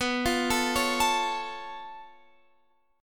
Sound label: C sharp Augmented 7th B, chord